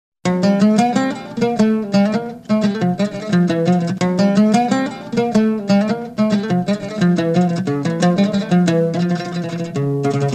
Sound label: oud